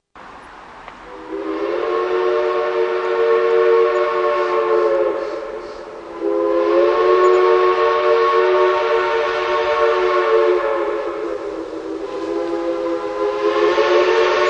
3chime, steam whistles; Crosby; NCRy

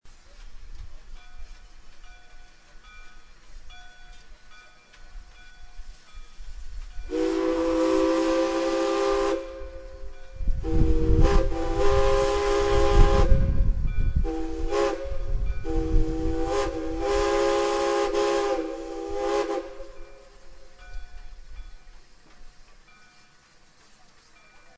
3chime hunt

